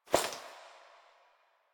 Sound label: Body Move; Frumentarius; Warframe; Ambience; Nineteen Ninety Nine; DE